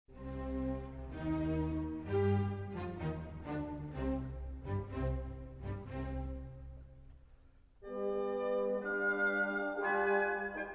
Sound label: Piano Concerto in C Minor, Allegro Con Brio; Beethoven; Piano Concerto No. 3; Op. 37 I.